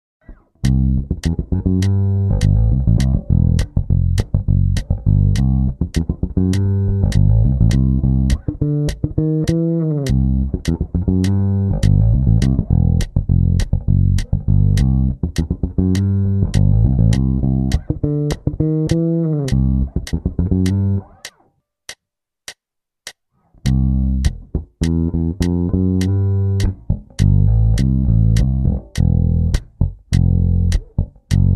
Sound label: Soulish bassline in C major, Soul-ish bassline in C major